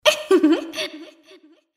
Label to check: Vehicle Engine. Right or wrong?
Wrong